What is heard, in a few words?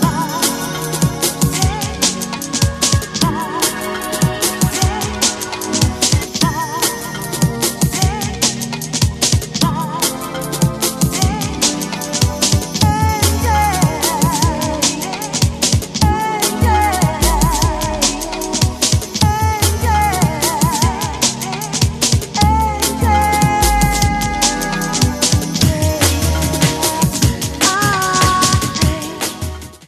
Dnb Samples